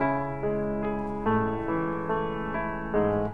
piano, Sad

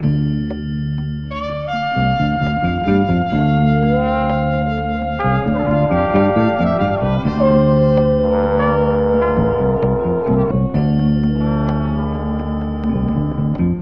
horns, jazz loops

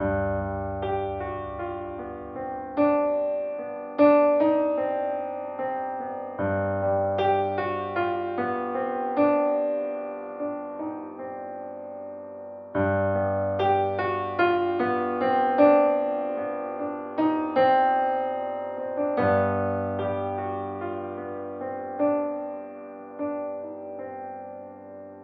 piano, Loops Sad

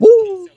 "woo", voice

